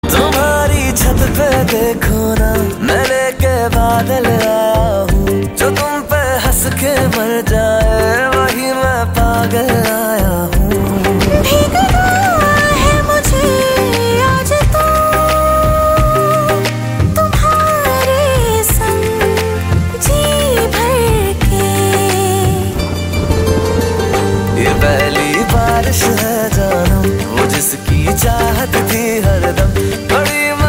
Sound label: HINDI SONG RINGTONE